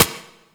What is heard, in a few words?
Turret Low Clip; weapons; Lasrian APC; Warframe